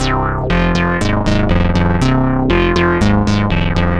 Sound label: Stop In Top Bass, Bass Foundations; Sonic Foundry (Sony Creative Software); The Electronic Music Manuscript Collection